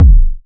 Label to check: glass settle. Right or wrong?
Wrong